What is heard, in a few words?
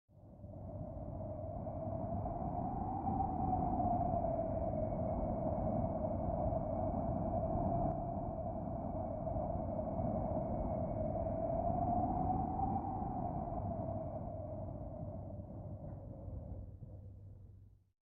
wind